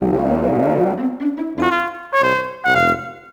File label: Expectant